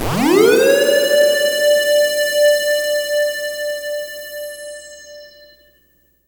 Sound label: GLIDE, Synth and Organ; InVision Interactive; Keith Emerson; The Most Dangerous Synth and Organ